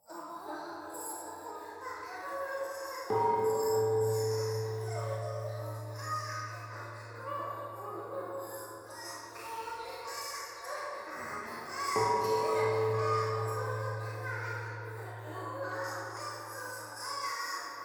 教会 低音喘ぎ 神社

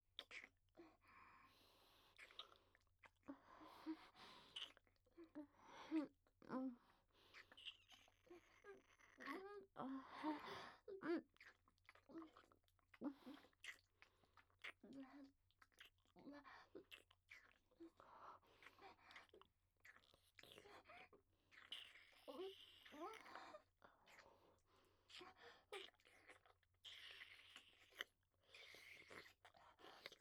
キス

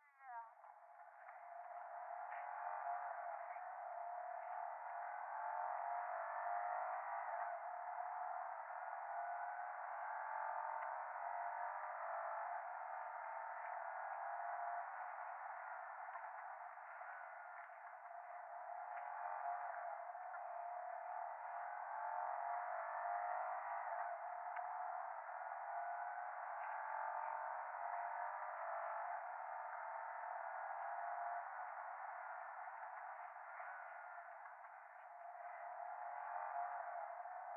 電話 フェラチオ 車の中, ASMR

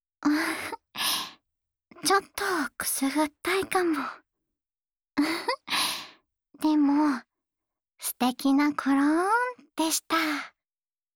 こそばゆい幸せたくさんの左の耳かき, ASMR

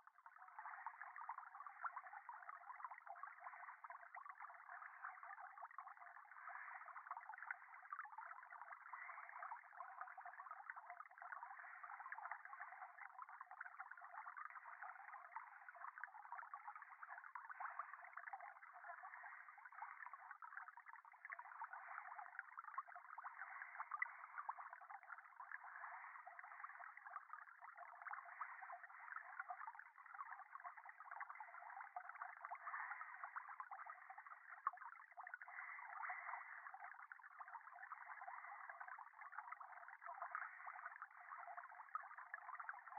電話 右耳密着吐息 川のせせらぎ

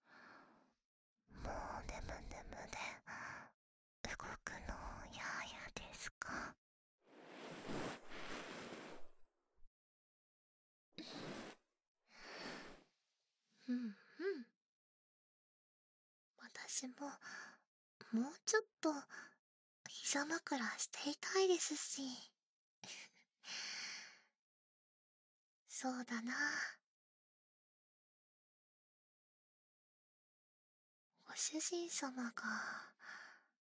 耳のマッサージ・指耳かき, ASMR

